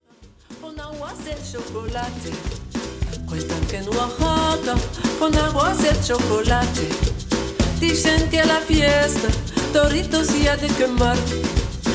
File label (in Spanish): Cumbia